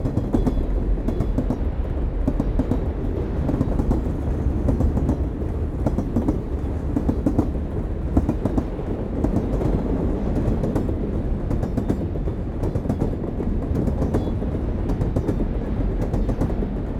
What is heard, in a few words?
Amb Train Interior; Splasher